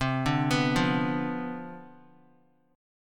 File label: C Major 7th Suspended 2nd Suspended 4th C down Guitar, chord; CM7sus2sus4 arpeggiated; JGuitar